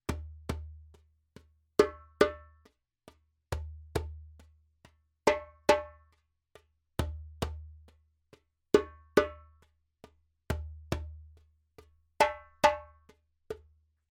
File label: djembe; djembebog oevelse